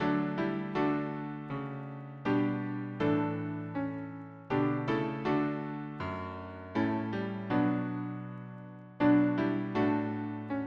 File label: harmony piano